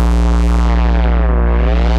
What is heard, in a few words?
Buzzy Field; Inspired by Nature by Dillon Bastan; Vector FM Presets